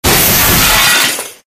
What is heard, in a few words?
shredder death robo; ambient; Source Engine; tf